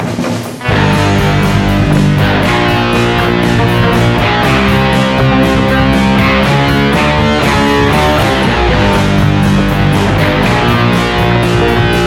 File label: Octaver